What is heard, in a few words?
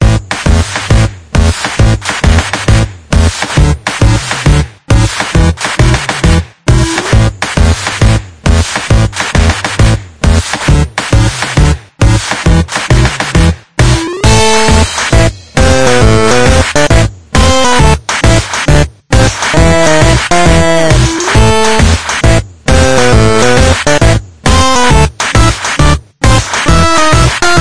8bit